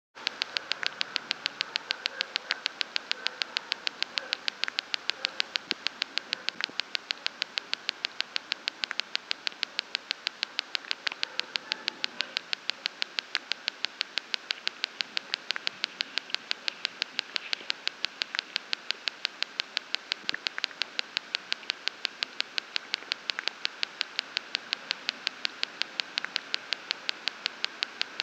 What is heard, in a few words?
Insect